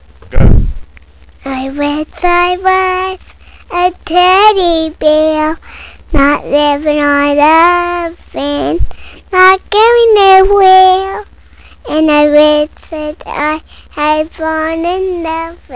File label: singing